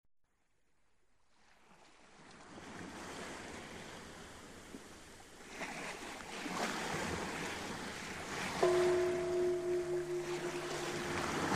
Background Score; Bandit Queen

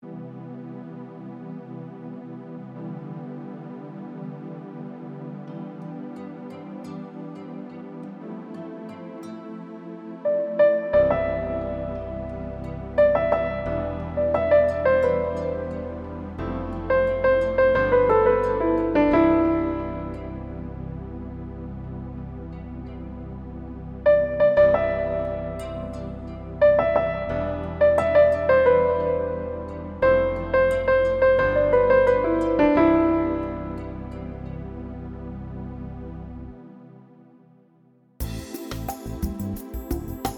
Soft Instrumental

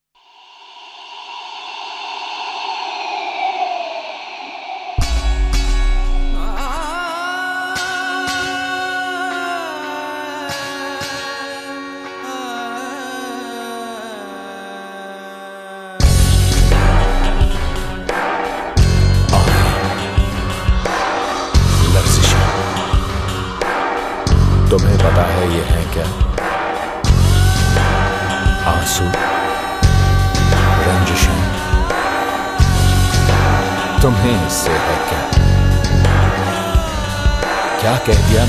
Instrumental

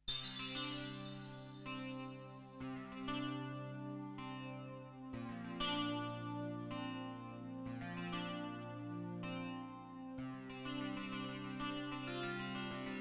Instrumental; Humsafar

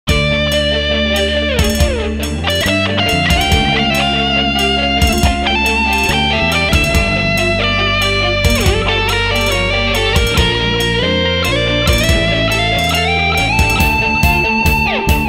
Instrumental

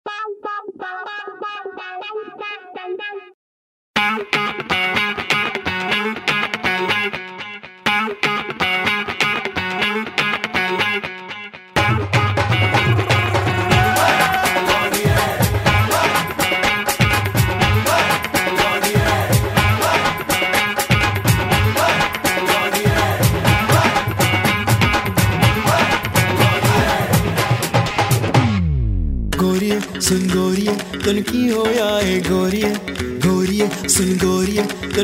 Funky Dhol Mix

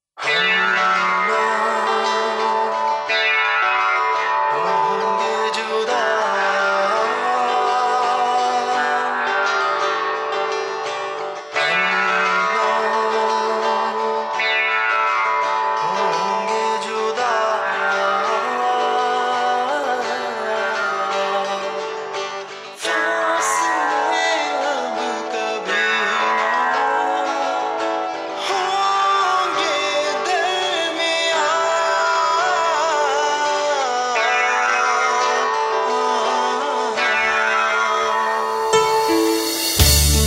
Karaoke

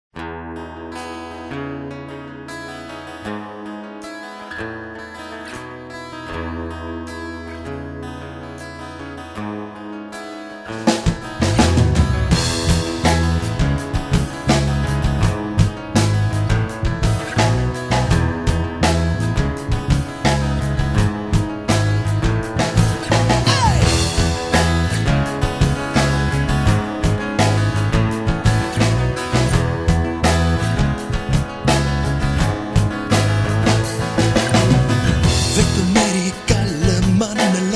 Acoustic

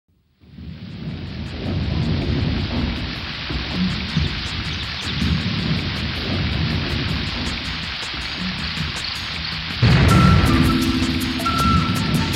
Slab Bass

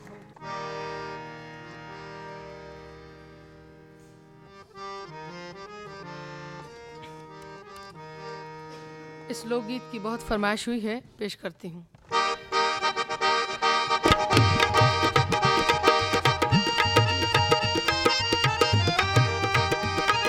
Folk Song

